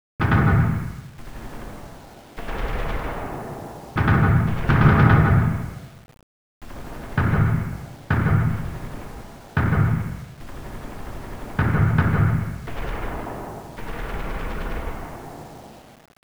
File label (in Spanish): ambiente hospital; ze hospital night